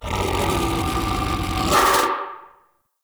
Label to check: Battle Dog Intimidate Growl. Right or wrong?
Right